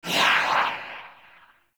Choir Dash

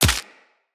Bullet Impact Head Hit Notification; Weapons

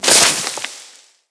Melee Impact Dirt

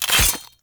Drone Blade Hit; Warframe

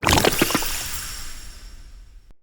Sap Crate Destroy; Neathuns

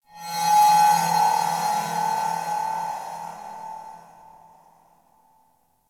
High Shimmer; Warframe; PVP; CTF Orokin; Ambience

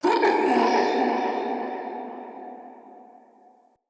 Ghost Pain, Vocals; Characters; Enemy; Nightfold Test Range Ghosts

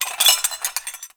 Metal Rattle; Props; Warframe; Tau; Ambience

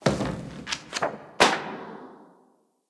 Station Misc, Ambience; Soul Plane; Orlick

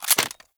Assault Rifle Reload; weapons; Warframe; Grineer

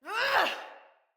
Attack Heavy Female; Battle Barks; Envoy

